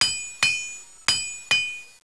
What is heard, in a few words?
weap workshop